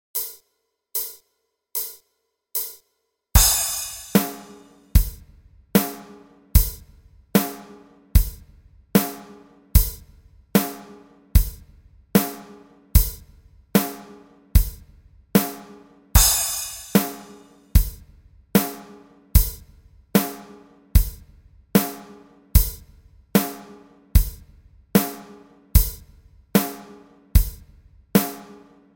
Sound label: bass exercises disco style slow